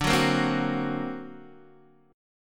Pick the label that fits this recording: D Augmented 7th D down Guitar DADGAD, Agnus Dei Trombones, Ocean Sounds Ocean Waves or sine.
D Augmented 7th D down Guitar DADGAD